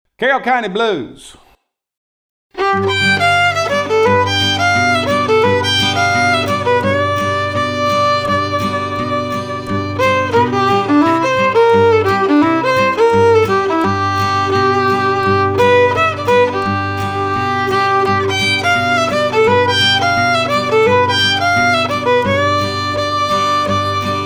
Carroll County Blues, field recordings, Mississippi music